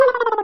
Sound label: pikmin wet